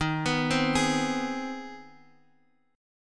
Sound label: C Minor Major 7th Sharp 5th Eb